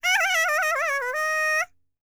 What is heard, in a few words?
BALKAN, World Voices; ILIO; Vocal Planet